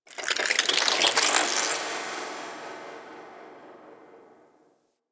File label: Cephalon Tech Small, Ambience